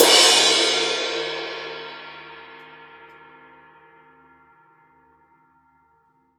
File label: Drums Acoustic, RAW KIT; USB Soundscan vol.10; AKAI; RAW KIT 5